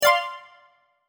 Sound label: syspop ok